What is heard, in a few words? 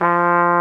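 Big Band Fast, trumpet; Roland L-CDC-01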